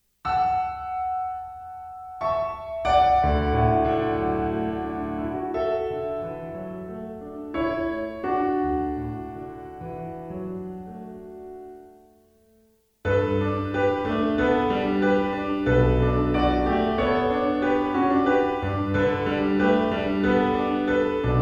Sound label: piano pop